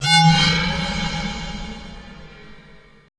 BASE, ELECTRONC

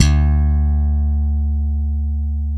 BS, E.Bass, Slap

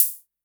Hi Hats Open; Drums; LIMAT Div Dept

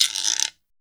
GUIRO UP; Drums In Your FACE!

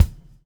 BUBBLE; Spectrasonics - Backbeat

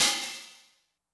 open hat MOD Tune, 909; classic machines; 909mod; OH - OPEN HAT

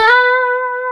B 4, Guitars; Spectrasonics; Hans Zimmer Guitars VOL-1; 90's Sample CDs; COMP POP+VIB

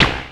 Percussive, Drums Perc One Shots

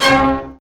DISCO FX; Zero-G; Funky Elements

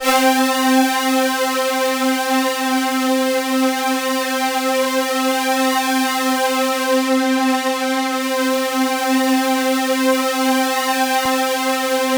C4, Synth Line; 90 s Sample CDs; NiceBeats; SynthLine VOL-20

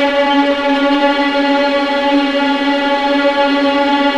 VLA C2 TRM R, VLAS TREMOLO

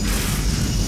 EXPLO; Best Service; Advanced Mega Effects

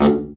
BRA Bari, SAX; Best Service Gigapack 1; BR SAX 2

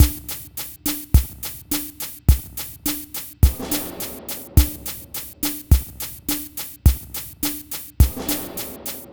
Multi Guitar Bossa Drumz, 105; Zero-G Kocktail Kollection; Construction Kitz (Lounge)

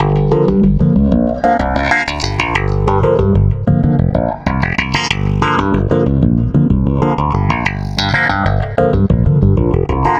Stick Loop, Stick Bass

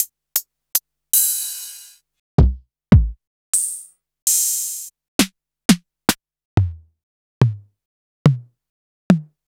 Electro, Kit

